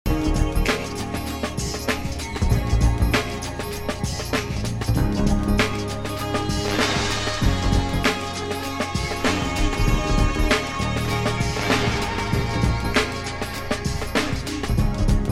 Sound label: snipps